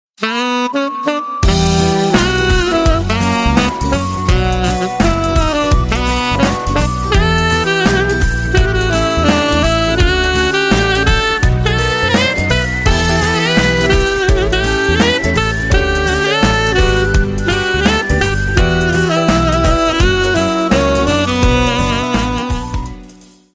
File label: instrumental